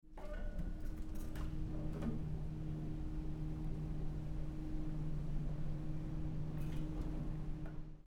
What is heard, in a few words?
elevator hum